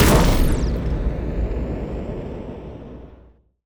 Launch Fire Grenade Missile

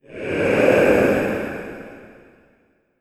Dark Ghostly Ambient Voice

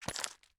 Walk Footstep Sneakers Sports Shoes Stones Debris

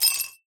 Broken Glass Scatter

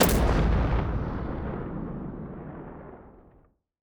Strike Explosion Grenade Launcher

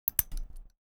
Padlock

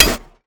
Hammer Metallic Hit Impact Strike

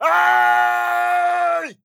Furious Yell Long

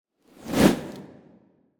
Ice Snow Transformation Short

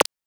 Stylish UI

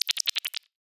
Digital Compute Hologram Telemetry

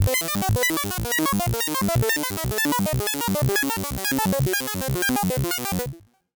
Chiptune

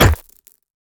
Crunchy Impact Concrete Slabs

